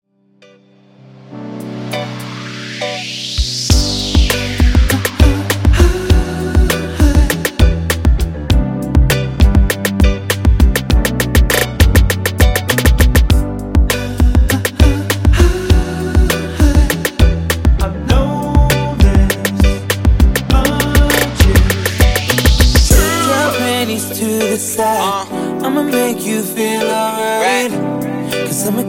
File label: MashUp 100 Bpm